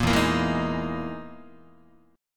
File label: E Major 7th Flat 5th down Guitar; EM7b5 strummed; JGuitar